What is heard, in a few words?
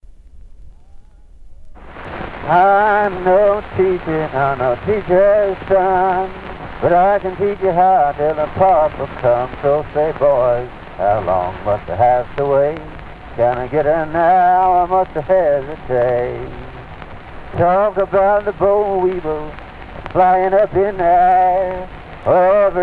Hesitation blues